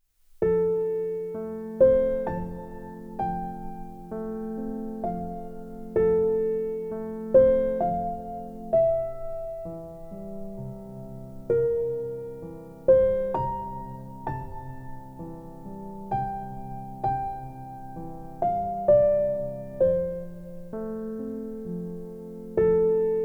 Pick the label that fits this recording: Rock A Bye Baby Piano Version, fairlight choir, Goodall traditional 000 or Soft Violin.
Rock A Bye Baby Piano Version